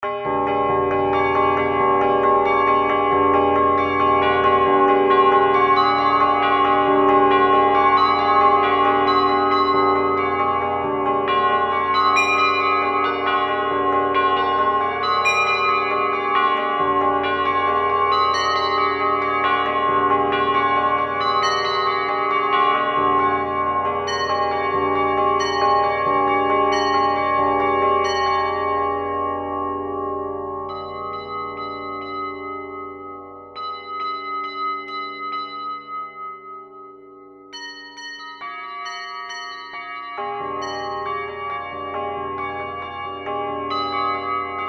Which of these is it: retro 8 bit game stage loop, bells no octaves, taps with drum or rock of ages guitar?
bells no octaves